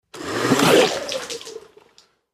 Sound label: Blender Pulse Lid Pop